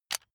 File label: electric button drill direction switch forward heavy